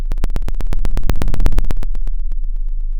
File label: Sound of a fire crackling